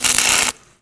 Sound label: elec spark welding; Call of Duty Modern Warfare